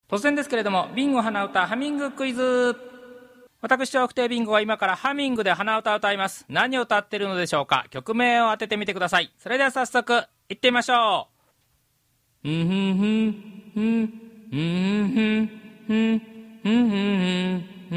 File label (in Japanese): hanauta